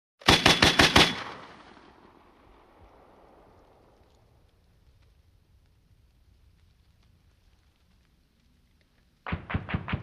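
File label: M60 Machine Gun Fire, exterior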